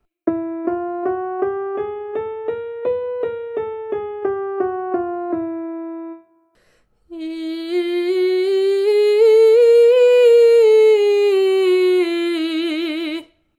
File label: Vocal Exercise